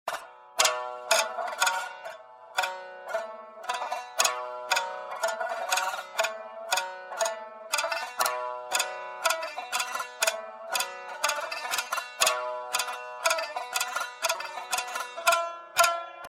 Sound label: Shamisen; phasegear